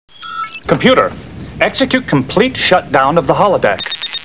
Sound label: shutdown; startrek